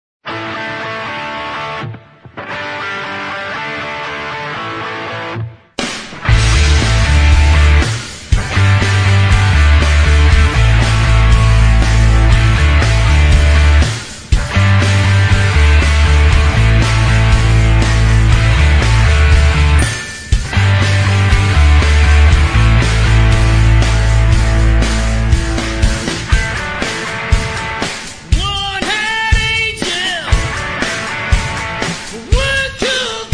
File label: Gated Cover